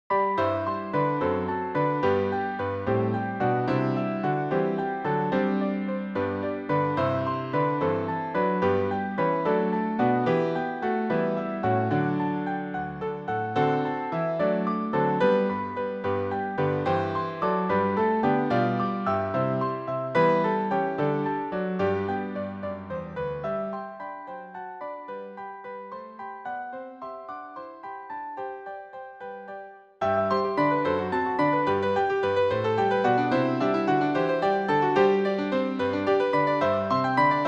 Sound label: hymn; variations